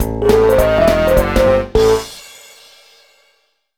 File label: Jumpy Jungle Lose